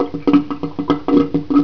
GURGLE

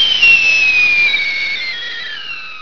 WHISTLE

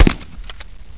SHOTGUN

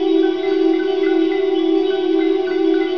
BEAST PAD

